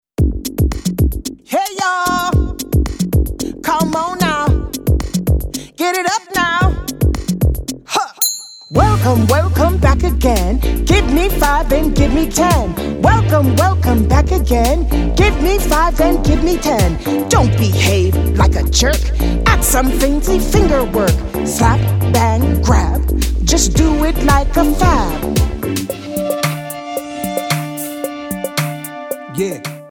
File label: SLAP BANG GRAB